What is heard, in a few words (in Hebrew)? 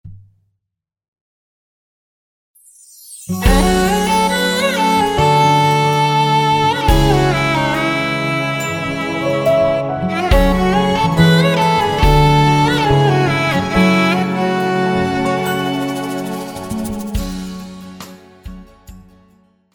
פתיח